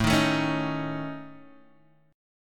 A Major Flat 5th A Guitar Open D, chord

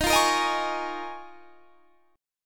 Eb 7th Suspended 2nd Suspended 4th Eb; strummed

